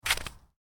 Footstep snow shoe, Shoes: Individual Footstep On Snow, Light; Human; Stuart Duffield